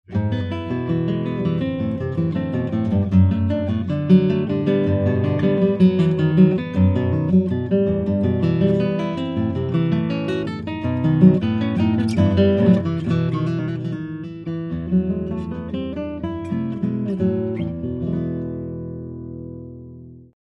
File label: Spruce, guitars; Bach; Allemande; Flute Suite in E Minor